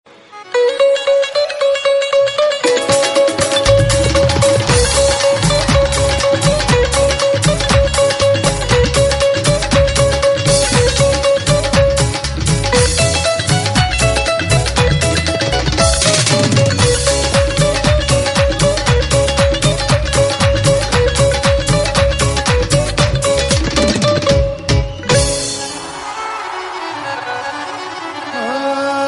Toombi Lok Dhun, Punjabi

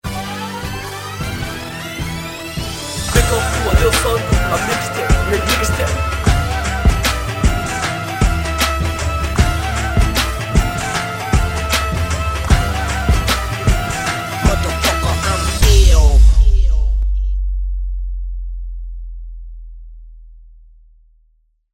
Dhol Vibes Outro; Punjabi

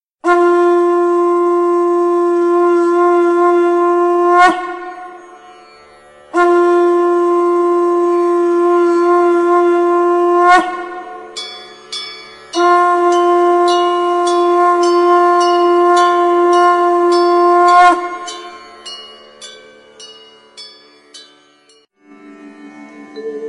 Shankhanaad Instrumental

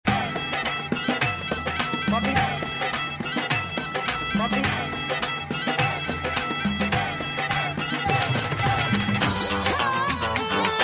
Punjabi, Street Mixes; UK Punjabi

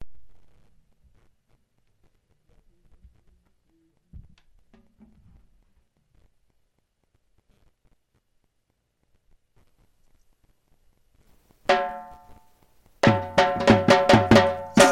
Folk Beat Instrumental, Punjabi

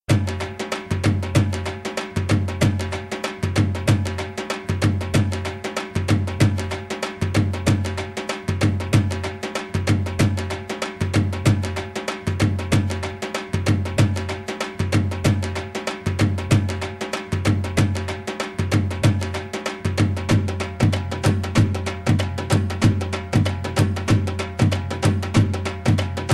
Dhol Rhythm; Punjabi

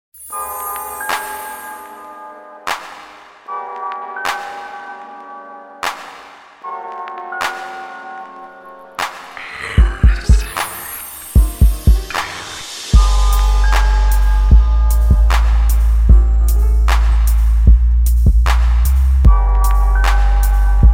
Beat Hip Hop; UK Punjabi

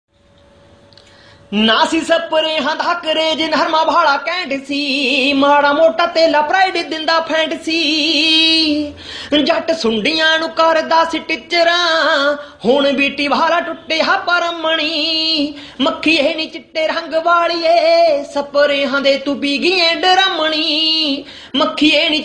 Desi Da Drum Funny Song

